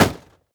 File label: plastic hit